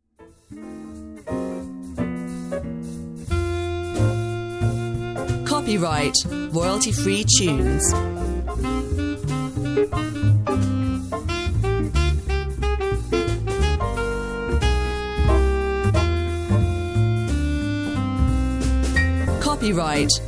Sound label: Cool Cat Jazz